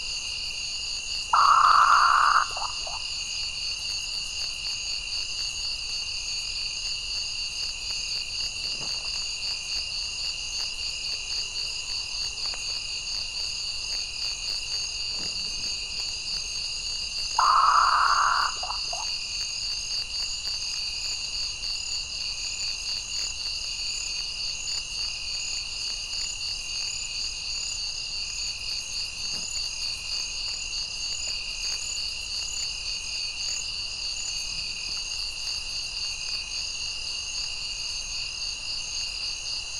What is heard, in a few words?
Northern Potoo Nyctibius jamaicensis